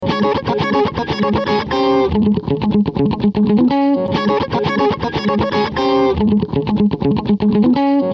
Guitar heavy compression

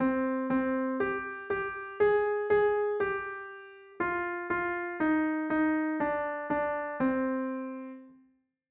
Twinkle Twinkle Minor

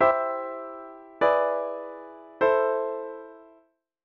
Secondary dominant example

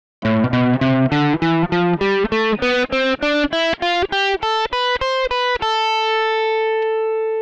dorian mode ear training

